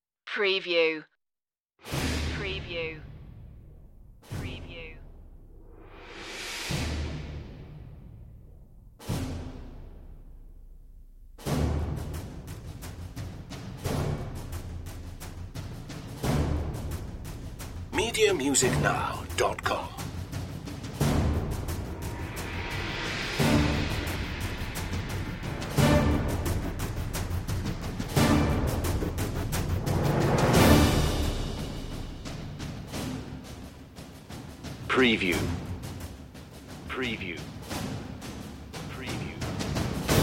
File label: perc